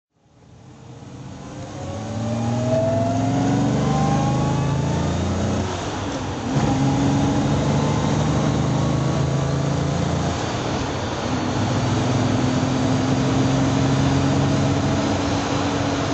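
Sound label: Jaguar E-type v12 drive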